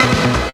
GRUNGE; AMG - Vince Clarke Lucky Bastard

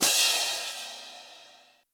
Crash, Yamaha RY20

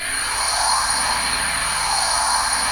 BELLOWS; Pro-Rec - Synthsonic

